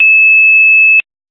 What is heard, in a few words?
2600 tone; Telephone; Phreking